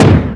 Diving Kick Miss; Sheik hitting the ground with her diving kick; Zelda

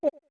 Gulp; Goron Link gulping a drink

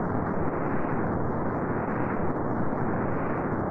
Fire Ring Loop; Zelda; OOT

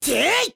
Attack

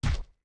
Step; Goron Link walking (same sound in 3 pitches)

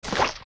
Sword Cut; Cutting an enemy with a sword; OOT

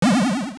Face Shrine Grow; The Face Shrine growing out of the ground (plays 4 times, 0.83 seconds apart); also used when a Pathmaker ends

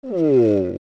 Ohh; Biggoron; zelda; OOT

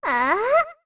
Ahh; Cremia: "Ahh?" (updated)

